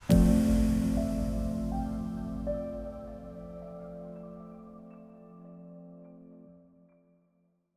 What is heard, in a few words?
Upgrade Complete; Opus: Echo of Starsong